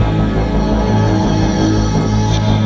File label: Spring Pad - 1A; Jungle; Pads; Blu Mar Ten; Jungle - 1989 to 1999